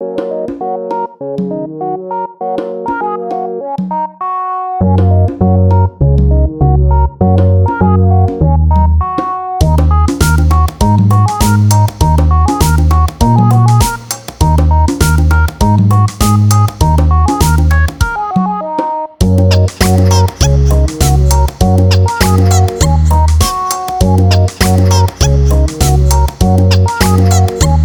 menu music; Give It Up

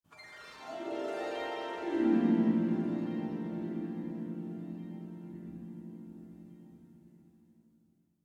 harp

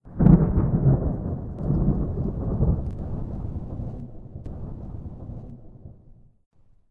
Thunder

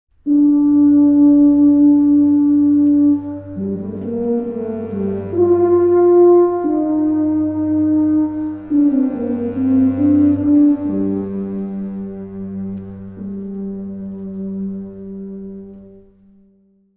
Solo Tuba; Greak Memories of Azur; ps4 ps5 switch windows xbox one xbox series xs

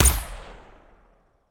Gun Shot; miside demo windows gamerip 2023

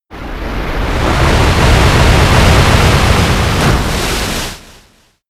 surf; pokemon sfx gen 7 attack moves sumo usum

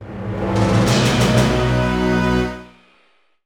Sting Collect; marvel super hero squad the infinity gauntlet 2010 nds gamerip

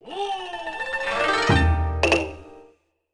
Jingle, Stage Clear; Sakura Samurai Art of the Sword; Wii

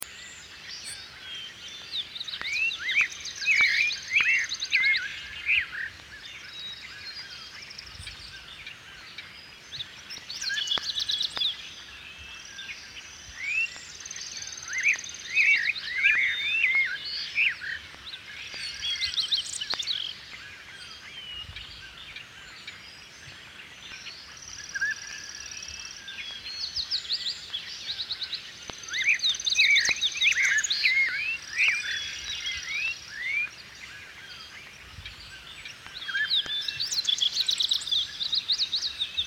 Naboo Hills

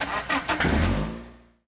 Compact Ignition

